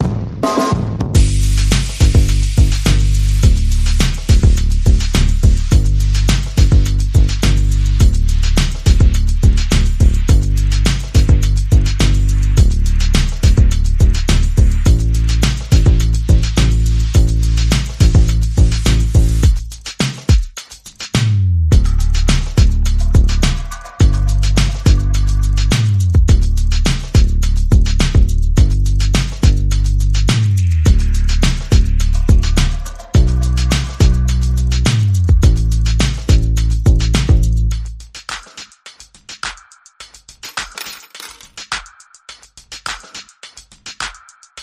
Percussion Bass and Effects

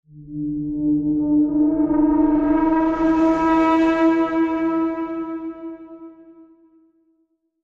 Expanse High Pitch Bend

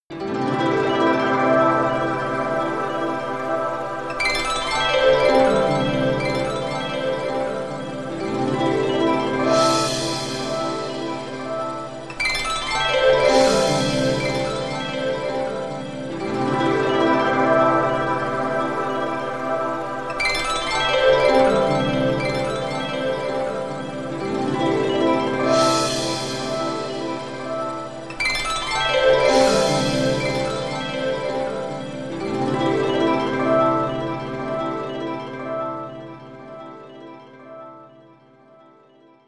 Harp

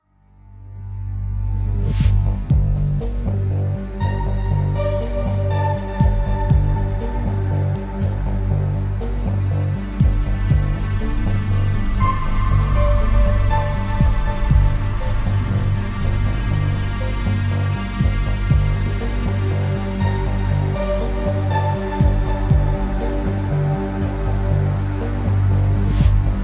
Aching Heartbeat

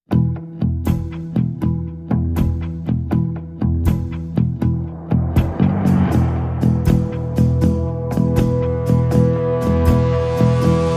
Bass and Percussion

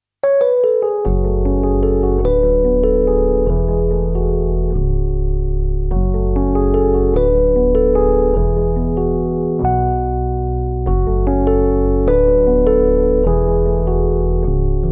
Rhodes

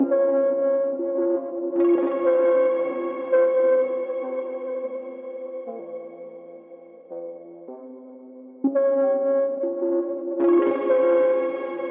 Ambient

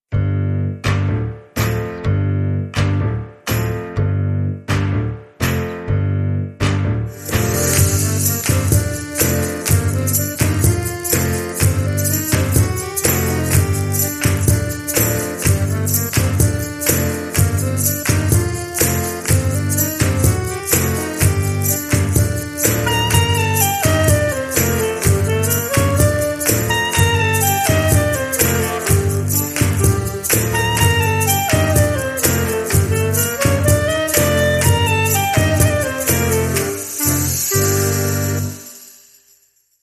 Clapping Farmer, Short Rhythm

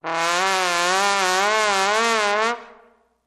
Trombone Gliss Up With Slow Vibrato

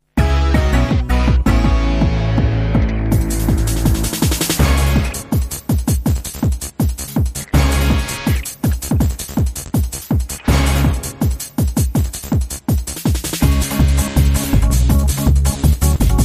Tacky Teckno Bed

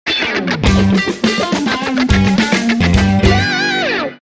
Drive That Funk, Sting

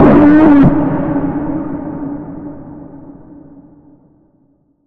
Hard To Bend, D

